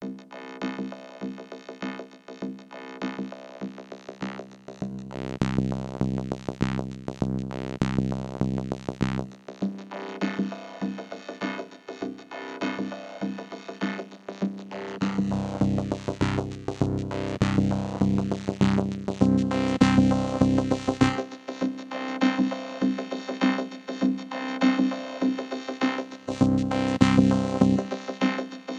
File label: vocoder drums filterquality